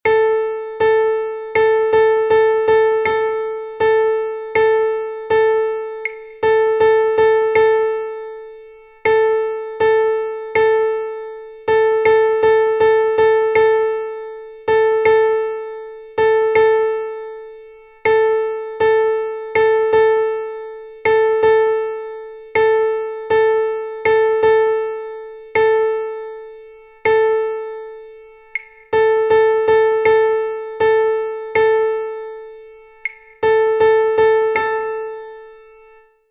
dictado ritmico; melodic and rhythmic dictations

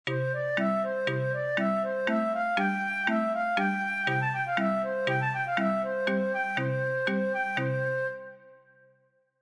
melodia acompanada; Frere jacques

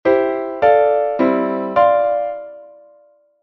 acordes

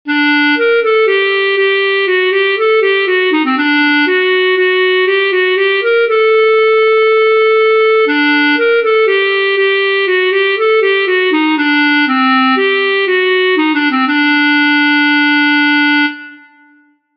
melodia klezmer; genre

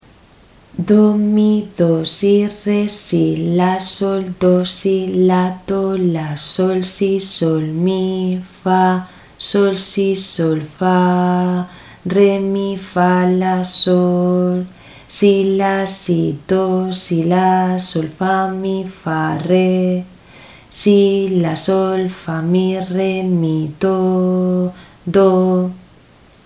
ritmo 6-4

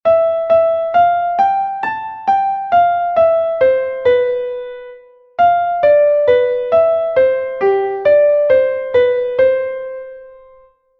melodia incorrecta

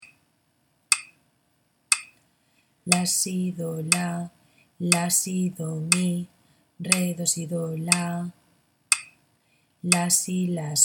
ritmo 6-4

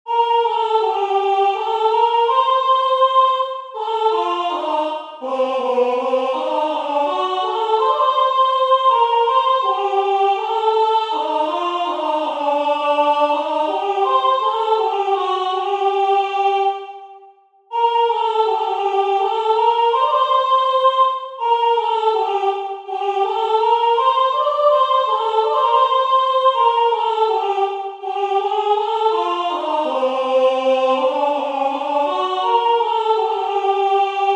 entonacion con piano, Voice; Entoación con acompañamento

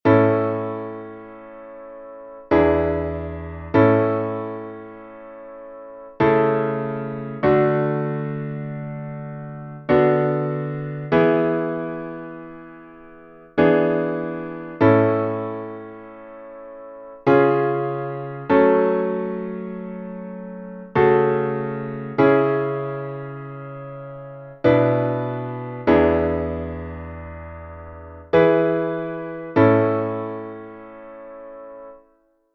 entonacion Piano; Entoación con acompañamento

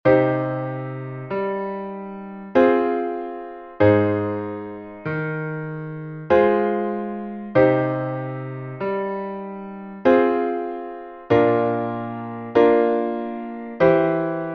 entonacion Piano; Entoación con acompañamento

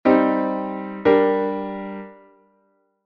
plagal en sol m; Cadencia Plagal

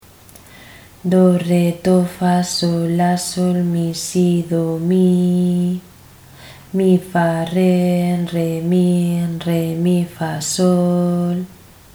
ritmo 6-4

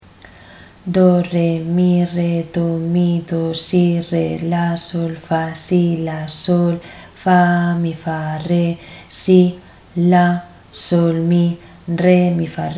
ritmo 6-4

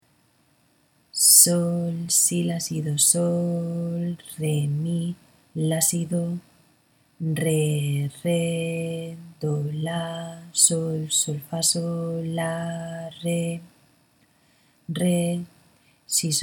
ritmo 6-4; Equivalencias

